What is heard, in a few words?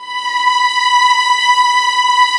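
violins; String Master Series; Vlns 6 mf-f; Vls6 f amb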